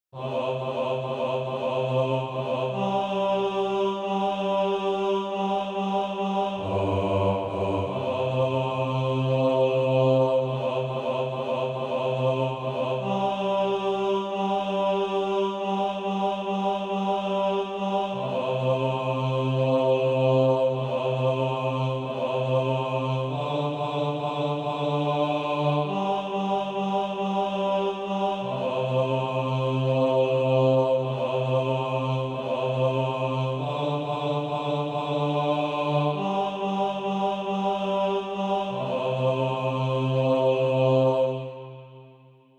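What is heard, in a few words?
bass, Sheet music (SATB) including Practise Music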